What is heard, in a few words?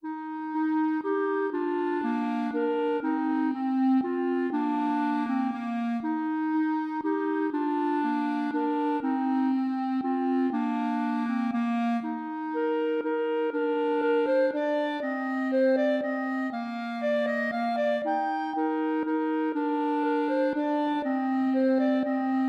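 chaconne clarinet duet; mattheson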